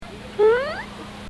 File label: Hmmm; Max Headroom; The Max Headroom Show; Don King